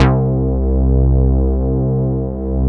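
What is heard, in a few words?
synth Analog; Best Service Gigapack 1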